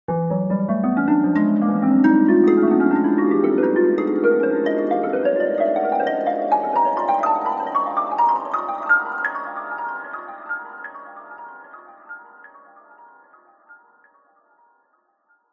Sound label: sound design heavenly dreamy glissando gone wrong confused nightmare